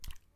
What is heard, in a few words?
Slime